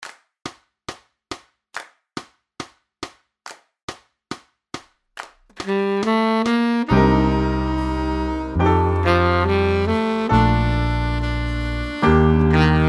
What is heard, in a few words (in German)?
ohne Floete